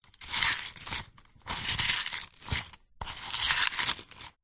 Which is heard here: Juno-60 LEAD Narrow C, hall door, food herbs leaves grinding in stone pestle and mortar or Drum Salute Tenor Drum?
food herbs leaves grinding in stone pestle and mortar